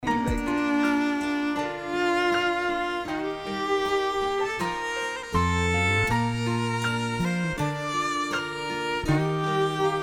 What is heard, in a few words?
SONG